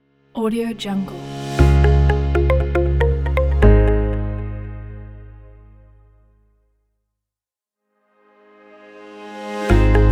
corporate logo

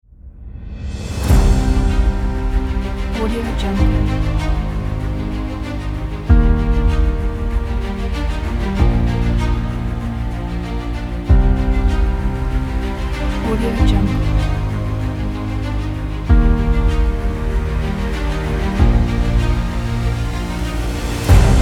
epic inspirational movie soundtrack

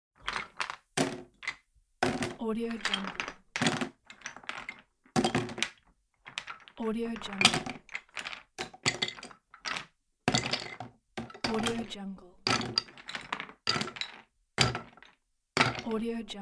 picking up childrens wooden blocks

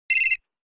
pager ring

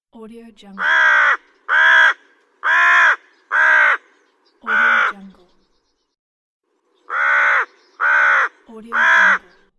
crow

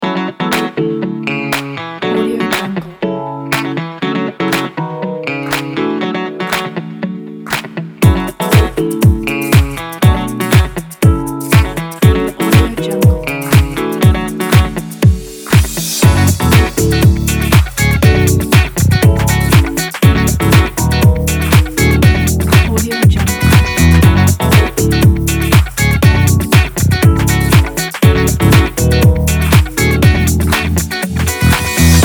disco

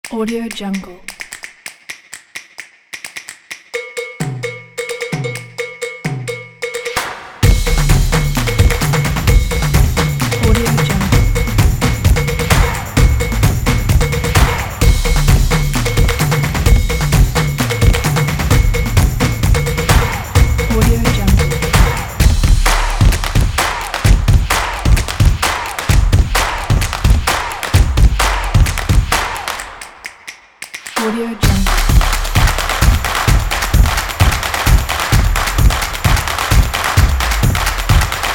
claps

